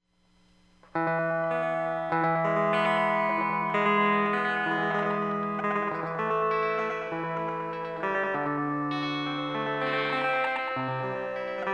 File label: echo